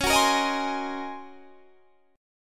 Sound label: D sharp Minor 13th C sharp, chord